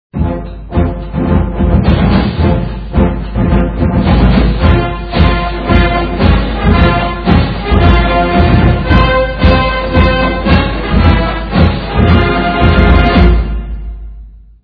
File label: Fight Song - Darth Vader Defense Cheer; Arkansas Razorbacks; NCAAF Football